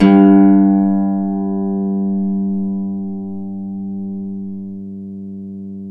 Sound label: Celtic Harp